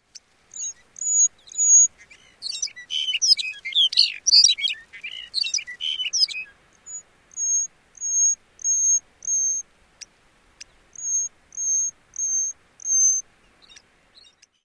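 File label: calls and song, bird; AK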